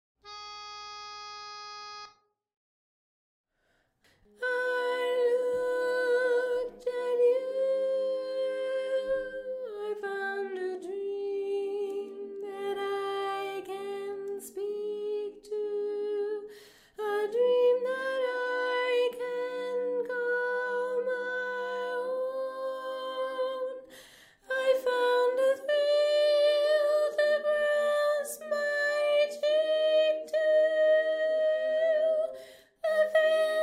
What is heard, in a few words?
Tenor